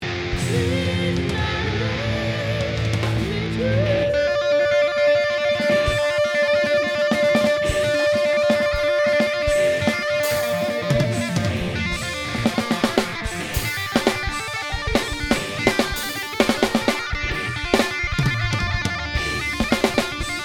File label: drums